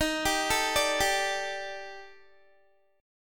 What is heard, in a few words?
D sharp Major 7th Flat 5th D sharp Guitar, chord; arpeggiated; JGuitar